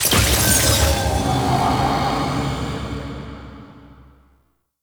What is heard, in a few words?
Bellow Pickup; Gameplay; Loot Crates; Warframe; Entrati